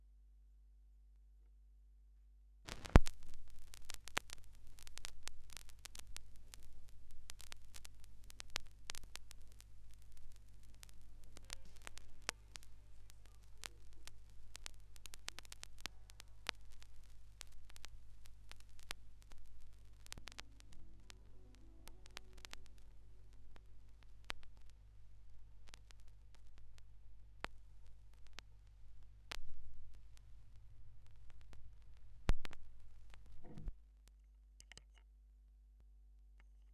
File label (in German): schallplattengeraeusche